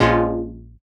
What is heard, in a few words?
stab rich, stabs